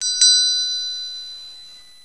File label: econo bell, handbells